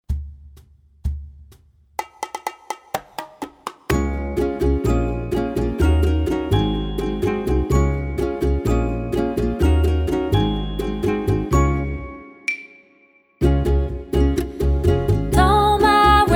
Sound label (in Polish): to male serduszko wok.